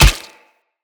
Hammer Impact Flesh; Weapon Impacts; Player; Combat; Tuvalkane Hammer

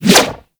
Whoosh, weapons; Warframe; Tenno; Ankyros; Prime Ankyros

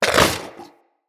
Vulpaphyla Vocal Tranquillized Grunt; Characters; Hunting Critters

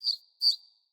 Bird Double Chirp; Galene Bird Guide

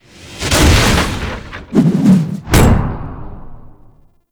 Assassination Bo Staff

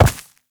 Uriel Step Vegetation; Warframe; Movement

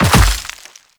Land Dirt, Footsteps; Warframe; The New War; Kahl

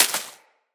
Bullet Impact Debris Grass; Weapons

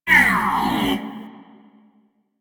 UMP Wind Down; Warframe; Weapons; Corpus

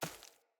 Battle Dog Walk Veg, Footsteps; Snc Battle Dog; Foley

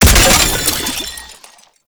Grenade Launcher Last Shot; Weapons; Scaldra

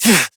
Pain, Adult Operator; New War

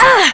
Generic Short Pain Operator Voice; Operator Mission

